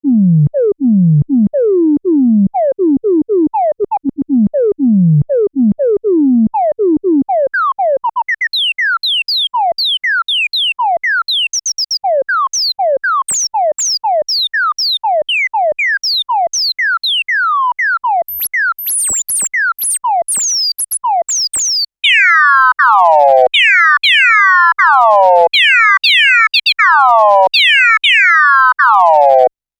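novation nova desktop filter resonant low pass filter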